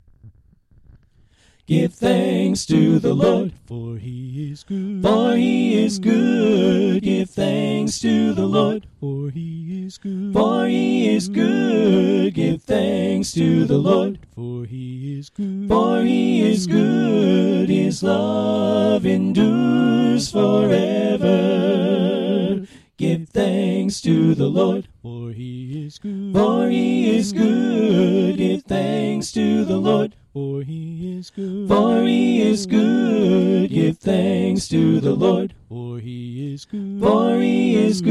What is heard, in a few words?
Soprano Right